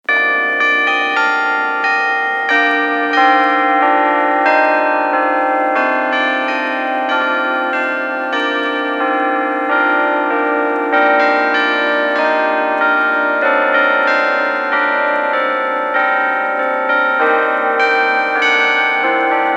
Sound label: Bells Carillon; Musical